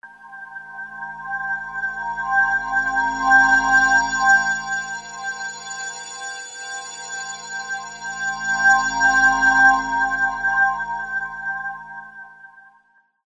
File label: Ambient Frost